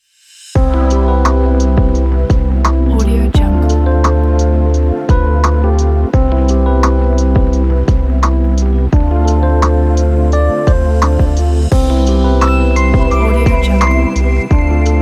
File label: lofi beauty